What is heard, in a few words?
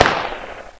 distant, weapons